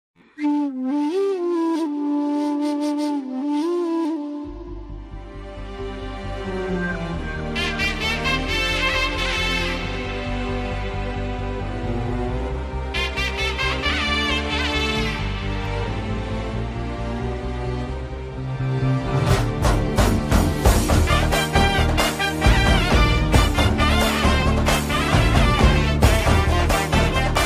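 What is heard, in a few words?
love background music ringtone; arm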